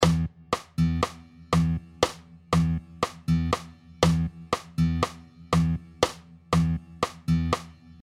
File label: Latin Bossanova Rhythm Pattern, guitar